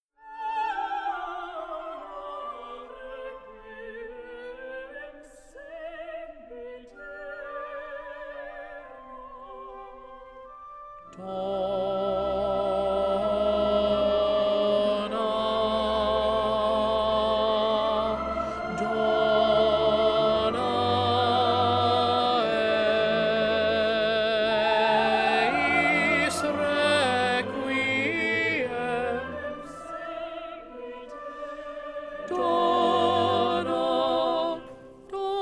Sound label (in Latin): Agnus Dei, Requiem; requiem verdi